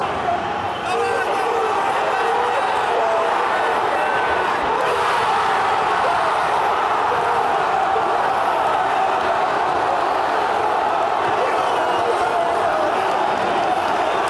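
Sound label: goal russia, football chant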